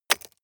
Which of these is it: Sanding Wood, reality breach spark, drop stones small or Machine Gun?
drop stones small